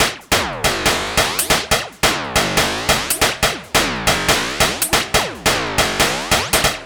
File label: DRM, LOOPS 140, House; Best Service ProSamples vol.10 - House; AKAI